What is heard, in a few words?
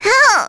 Vox Damage; Lavril; kingsraid; voices heroes en